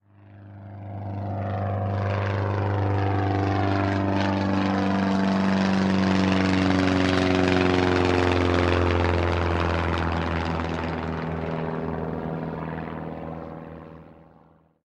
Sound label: passing Plane; netent; narcos